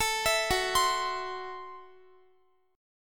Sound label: Gb Minor 7th Flat 5th, Guitar; Gbm7b5; arpeggiated; x,x,19,21,18,19